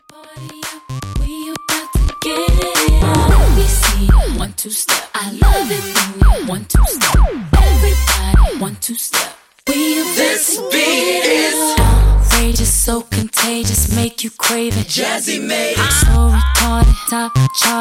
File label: 113Bpm